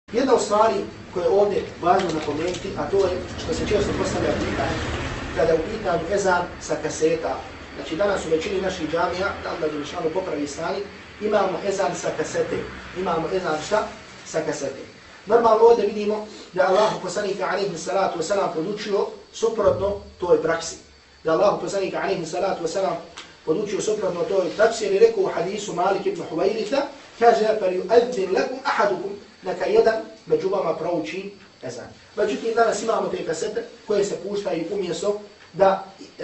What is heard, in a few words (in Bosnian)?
Ezan sa kasete